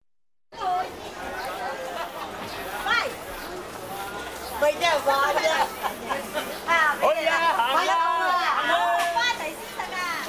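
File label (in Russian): китайский рынок общая атмосфера голоса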